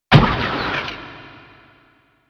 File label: Halloween Scene Sound FX; hyper terrestrial; Incomprehensible